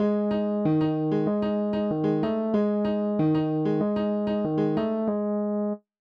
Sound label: jingle jingle